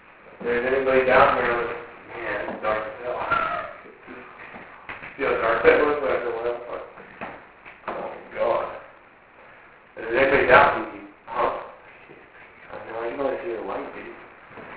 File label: female shriek and other voices; oly dig silver